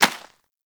rodent hop step rear dirt, forest rodent footsteps; Warframe; Characters